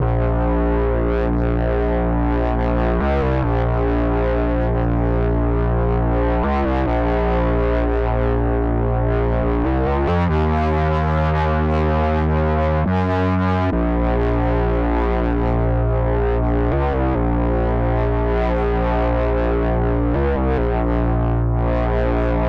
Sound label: 140 BPM A# Min Bass, Melody Loops, Synth Collection; Cymatics; ANALOG EVOLUTION Beta